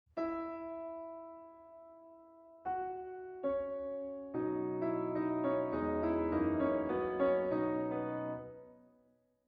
Steinway Soft Pedal Off

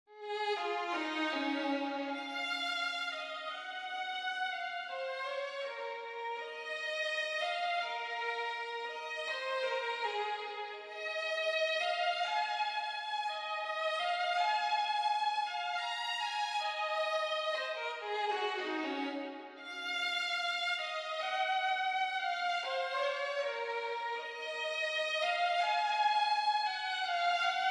Vn Solo

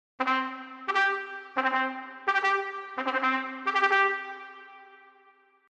upbeat

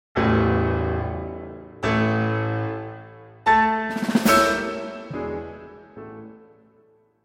Intro piano

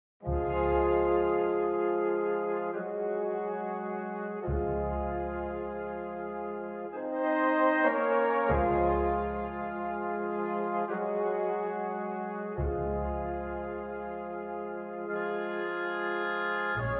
wo piano

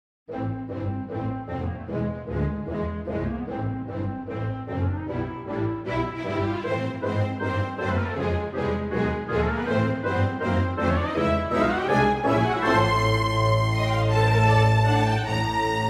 wood strings

